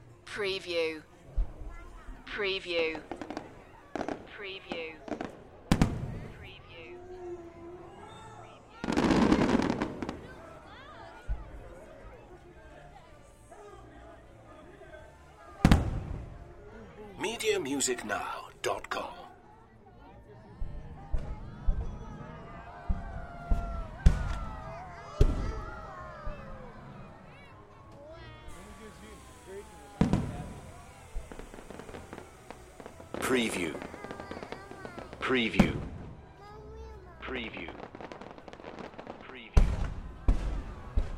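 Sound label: Fireworks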